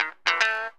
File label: GTR RIF; INSTR LOP; E-Lab; X Static Goldmine 2; akai